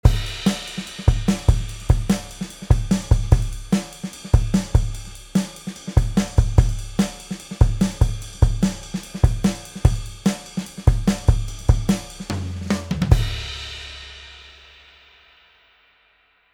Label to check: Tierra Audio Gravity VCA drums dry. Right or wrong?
Right